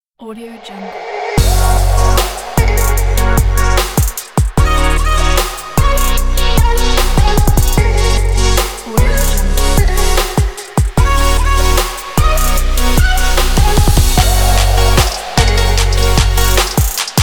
future bass fashion intro logo

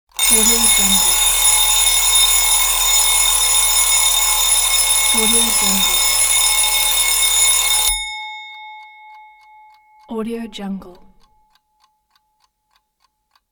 alarm clock

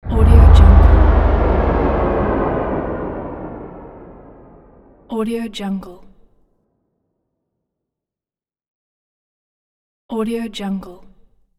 synth down riser

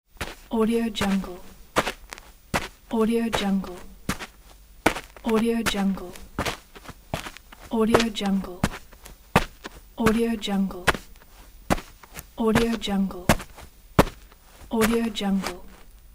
footsteps in shoes on sand; skarabei

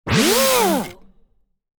device spin stop sudden; cinematic-sound-design

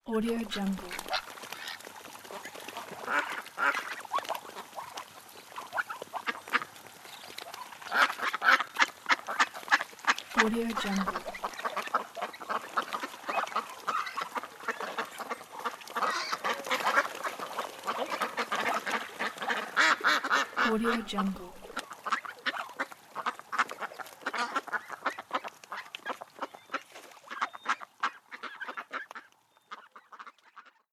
ducks

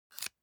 object fire match light fail; smartsoundfx

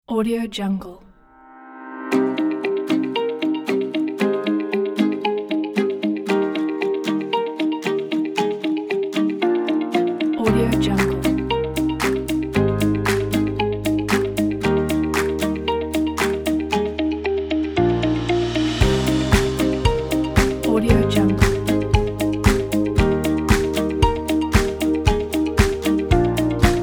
uplifting corporate music

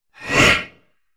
quick eerie breath reverse stings

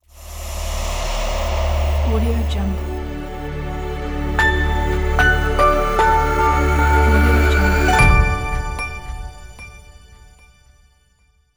welcome intro logo

